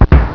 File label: cannon; svencoop; mario